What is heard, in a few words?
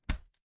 impact slam